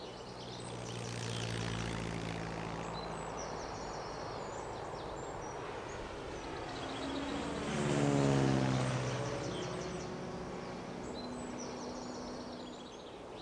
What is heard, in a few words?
Amb Airport